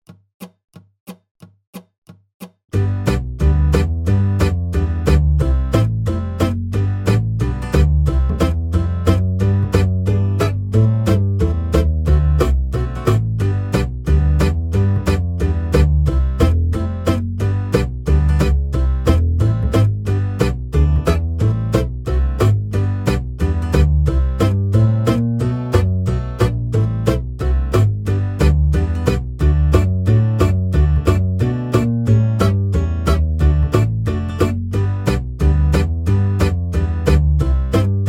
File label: backing track 90 BPM; Holiday 2025 Dobro Zoom Workshops